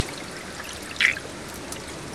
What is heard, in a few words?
Oncorhynchus tshawytscha, fish